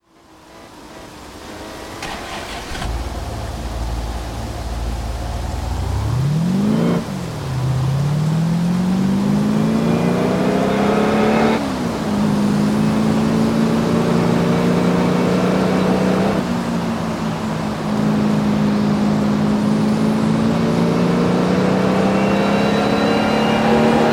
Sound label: saleen s281 extreme; TDU-1